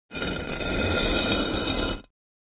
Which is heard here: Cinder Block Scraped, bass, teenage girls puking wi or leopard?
Cinder Block Scraped